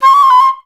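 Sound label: flutes C5; Best Service; Peter Siedlaczek's Advanced Orchestra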